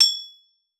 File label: AMBO; Xsample VOL-9